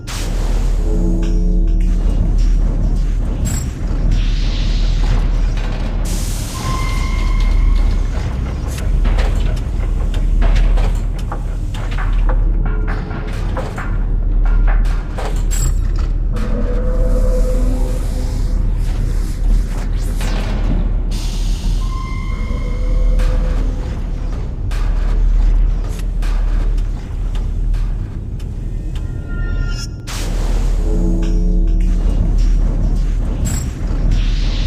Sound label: Ambient Machinery; Hoodlum Machinery; rayman 3 hoodlum havoc gc ps2 windows xbox 2003